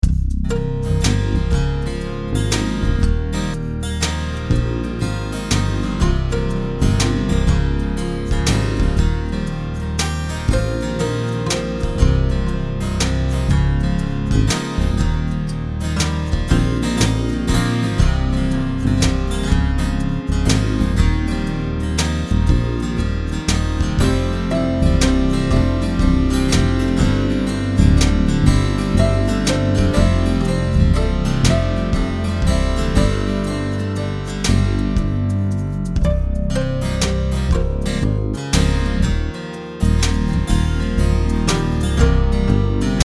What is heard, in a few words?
Pure ensemble